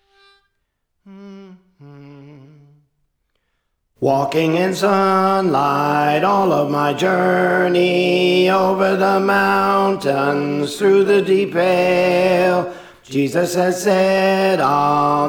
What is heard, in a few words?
Alto; Walking in Sunlight; Praise For The Lord Training